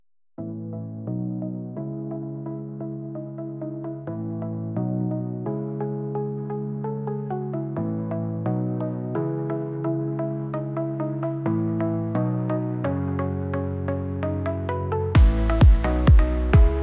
Digital Duet

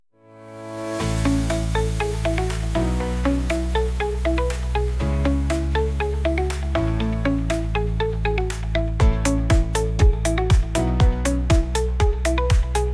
Electric Heartbeat

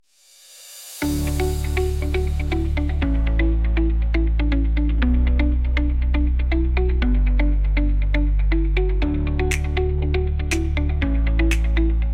Future-Funk

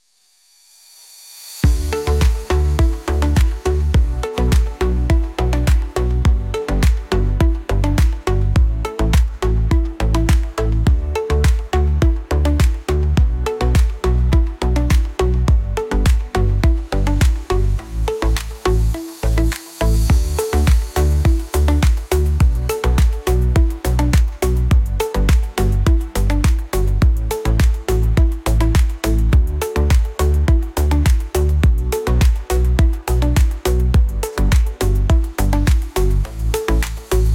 Synthwave Sonata

